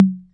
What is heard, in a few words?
Korg KR-55, drum machine; drum machines